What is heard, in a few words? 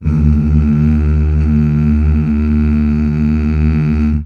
MMHH